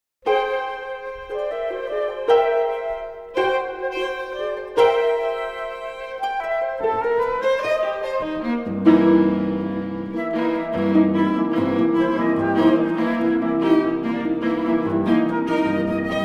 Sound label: Trio Music First Movement